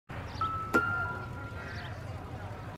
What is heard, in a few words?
examine jingle; la noir